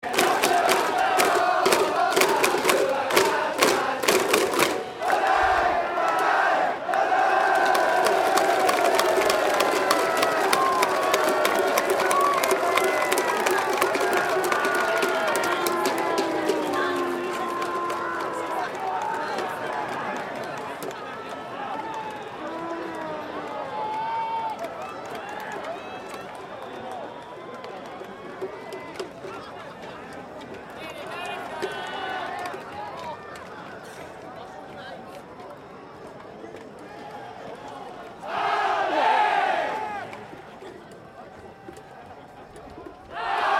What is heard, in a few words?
Baseball Crowd Chant Japan; Hiroshima Carp; Japanese sports crowd cheering; Baseball game